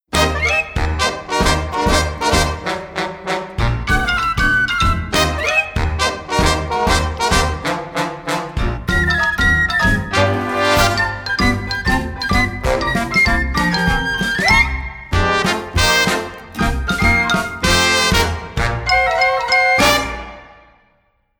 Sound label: Kids Playoff Vocal Guide